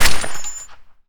BIZON

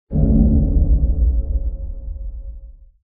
Hit Interior

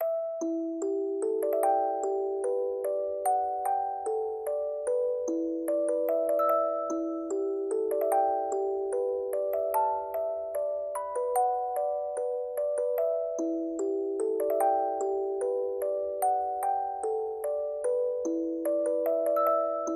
Prelude Music Box; Fault Milestone Two

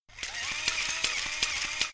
Robot Moving Concrete

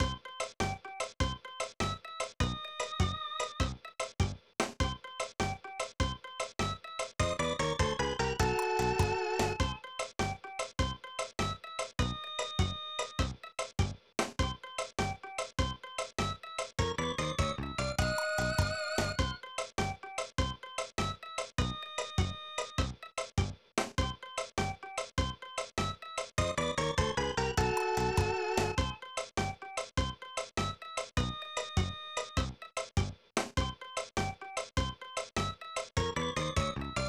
Mission Failed; Ball Bullet Gun; SNES

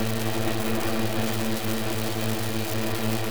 hum electric sparks interference loop

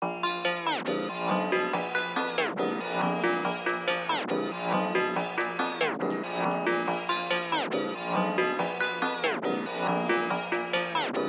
Instrumental; Void

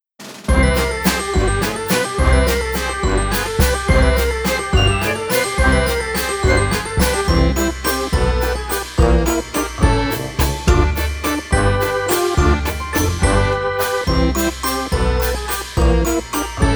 Waltz; Dungeonland

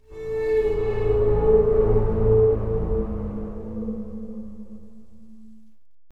Dark Ambience Sting; dead rising 3 apocalypse edition

